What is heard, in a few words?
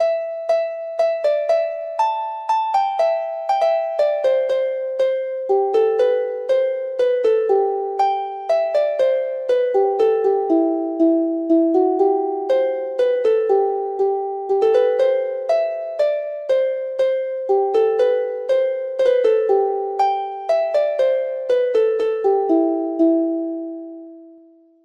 harp